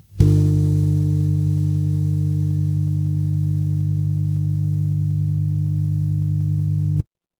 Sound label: fire electric hum